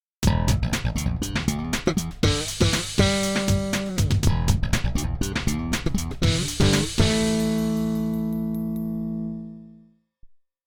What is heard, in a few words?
Warm Tube Envelope